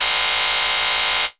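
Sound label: MALFUNCTION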